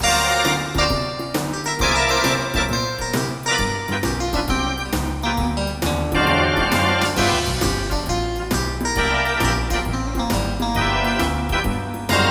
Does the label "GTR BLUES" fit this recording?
Yes